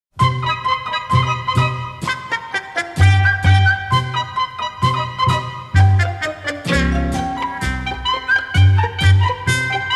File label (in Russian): muzykalnaya vstavka